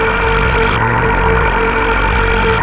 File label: lab gear, ambience